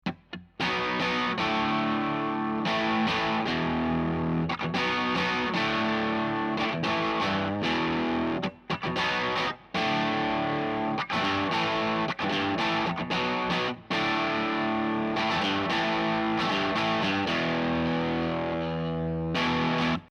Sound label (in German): orange halbe zerre strat s